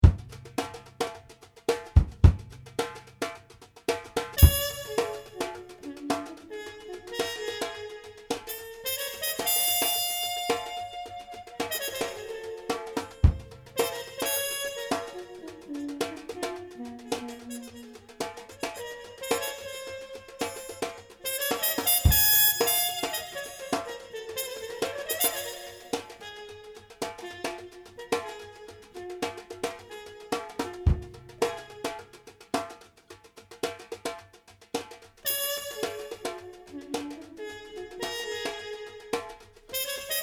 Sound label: Trumpet; Mummenschanz-Abspann